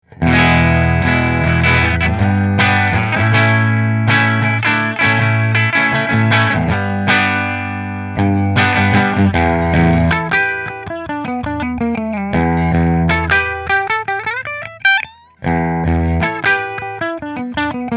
Aria Pro Bridge Fender

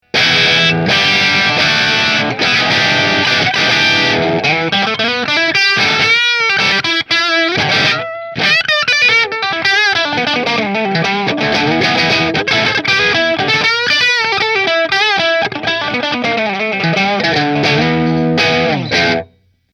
Orville Bridge Marshall, guitars

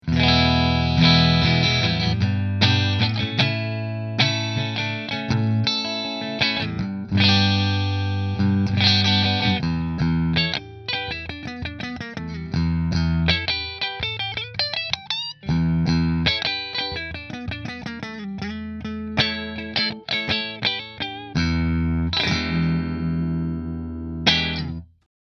Green Middle Split Fender; New Orleans Guitars Voodoo Custom Dark Green

